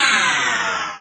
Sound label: power; quake2